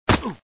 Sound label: hit; Gun Mayhem 2